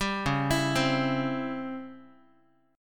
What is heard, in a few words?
Db Minor Major 7th Flat 5th Db down Guitar; DbmM7b5 arpeggiated; JGuitar